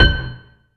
HAMMER BL, JV-1080; Syntec; Wall of Sounds

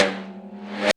REV TOM, ST.REV.TOMS; Back In Time Records; Big Bang

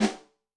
Fat brass, Yamaha DTXpress-II snare

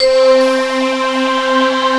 ATMO SYNTH

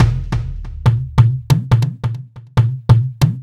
Addon Loop, Drum Loops 140bpm, Perc Loops

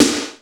NEW SD, MOD. DRUMS; East West; Global Sounds

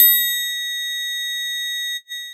Yamaha CS6

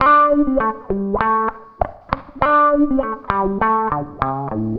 WAH, wah wah gtr

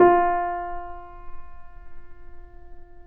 PP F 0, Grand Piano off mic; AKAI S6000 CD-ROM - Volume 2

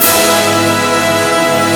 ORCH CHRD; Greytsounds VOL-1; SYNCLAV TRAX

